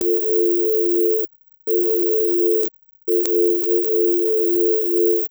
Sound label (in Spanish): tono; 3cx; voz